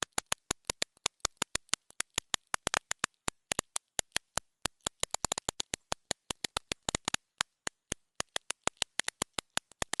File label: small gear plastic metal slow; sonniss gdc